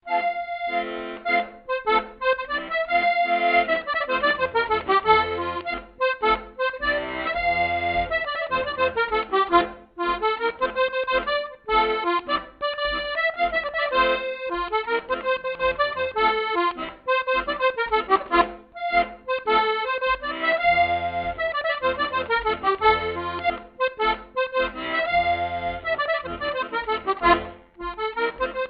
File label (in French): accordeon; las coconairas de casin de roca